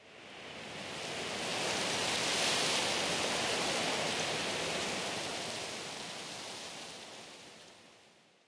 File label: wind, ambient; STALZONE